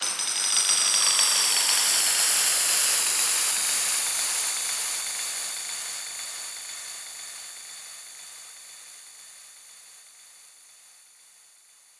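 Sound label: 120 F gentle fx tambourine noise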